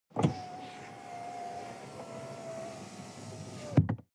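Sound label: sports car interior window close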